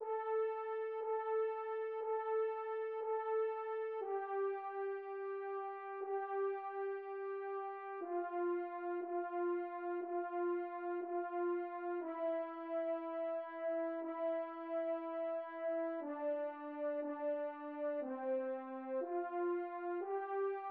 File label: midi